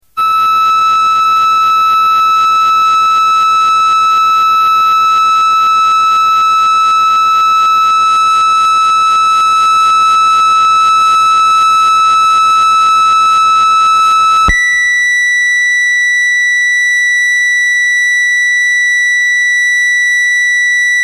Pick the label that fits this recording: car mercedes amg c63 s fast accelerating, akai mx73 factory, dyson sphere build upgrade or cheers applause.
akai mx73 factory